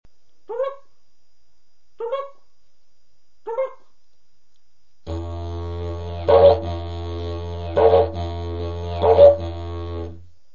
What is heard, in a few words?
Brolga, Didgeridoo